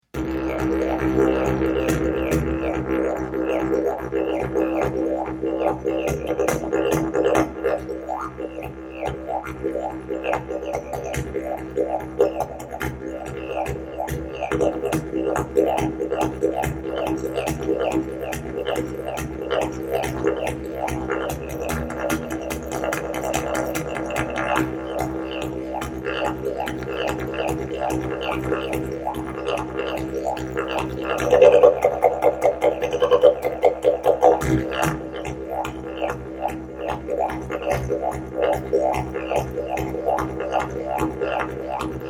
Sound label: didge